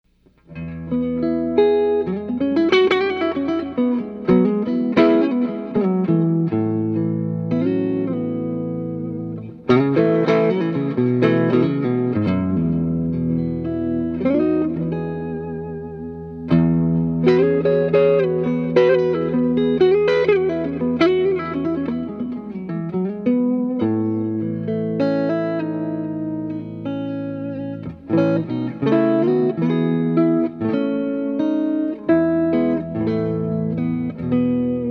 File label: strat smooth chords pedal on Overdrive